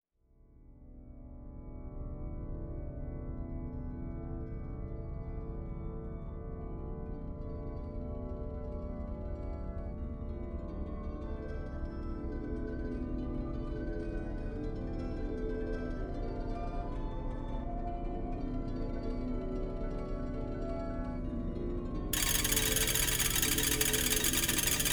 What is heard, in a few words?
Ac Gtr